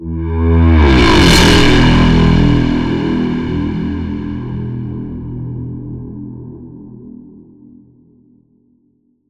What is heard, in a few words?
foghorn ghost ship; dimensions